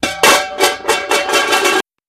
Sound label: Hubcap Manhole Cov, crashes